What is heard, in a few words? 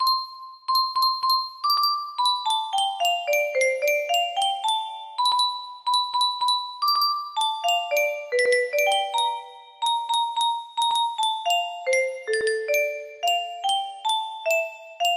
music box